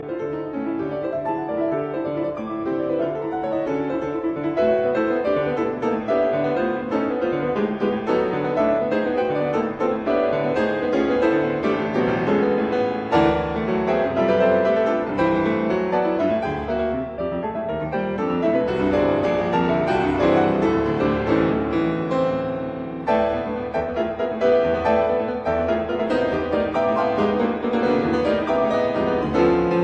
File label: Danza del tambor Dance of the drum; Caturla; Juana Zayas